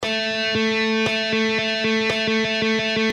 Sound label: Guitar triplets